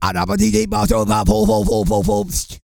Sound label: REGGAE, Vocal Planet World Voices